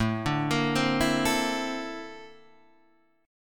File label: A sharp Major Sharp 11th, A